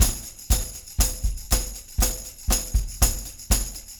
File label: 120 TEARS bt1 + tam